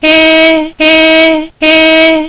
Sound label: heeee; トリビアの泉